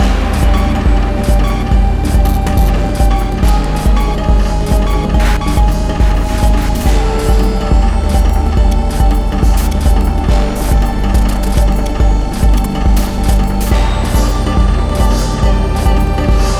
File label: Gamelan techno Medium